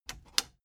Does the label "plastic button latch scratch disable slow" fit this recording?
Yes